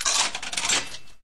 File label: bet, Slot Machines; RS Games Sounds